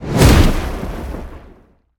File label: explode; smite; god sol